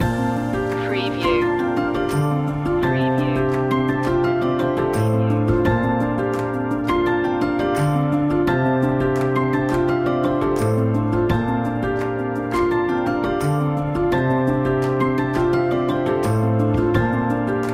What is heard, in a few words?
right track loop